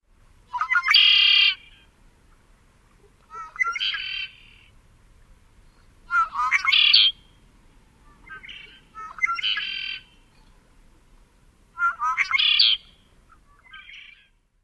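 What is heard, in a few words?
red-winged blackbird songs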